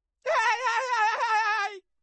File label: High Score Screen; spongebob; nickmaster